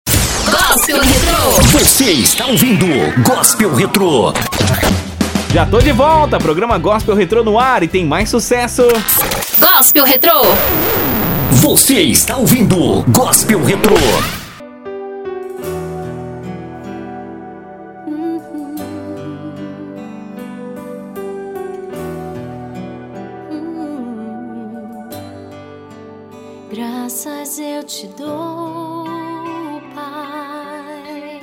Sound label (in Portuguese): Gospel Retro